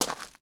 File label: Foot Gravel Shoes Walk